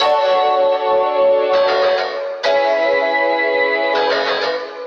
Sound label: keys, Phonky loops